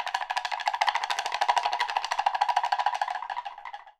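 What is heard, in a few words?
AFRICAN WO, SHAKER; Voice Crystal - Steve Reid Definitive Percussion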